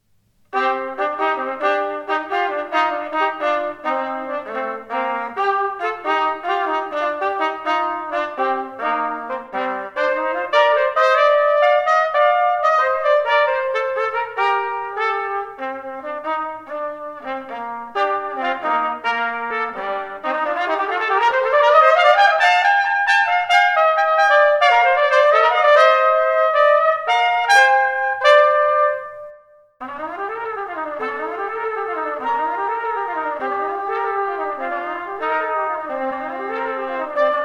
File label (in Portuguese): Frigio Duetos Trp